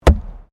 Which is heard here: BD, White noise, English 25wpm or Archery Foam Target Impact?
Archery Foam Target Impact